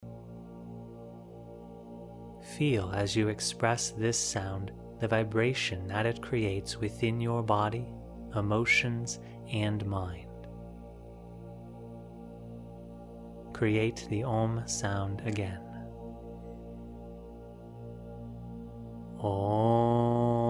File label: Traditional OM Mantra